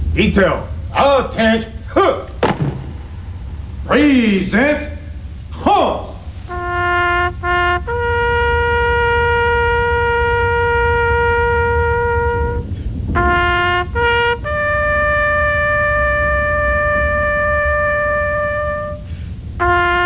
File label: taps; TWC Clan Site; JKDF2